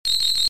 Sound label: beep beep; ringtones; sms